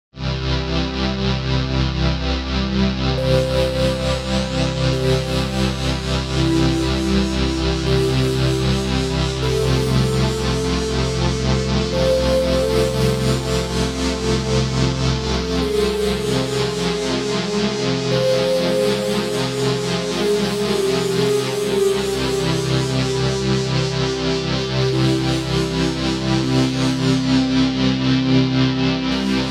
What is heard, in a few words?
bee choir